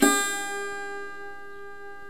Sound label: SAROD